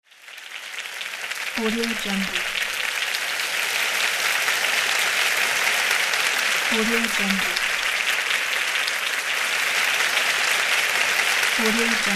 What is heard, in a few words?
applause